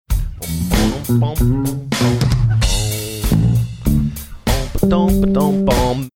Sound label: singing along funky bass